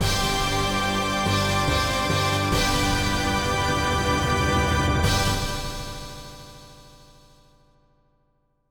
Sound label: unknown jingle; Shining Force 3; Saturn rip